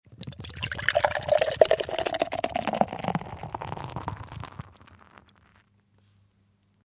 beer, sizzle

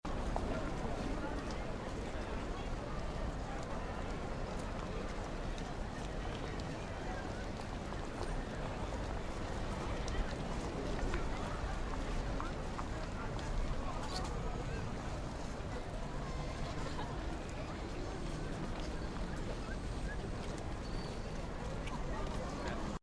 city ginza, ambience

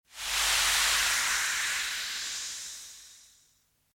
carbonated drink, sizzle

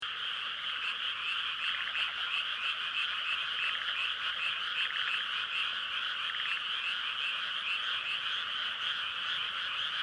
frog, nature

